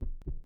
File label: Heartbeat, game music; lethal company; ambience; windows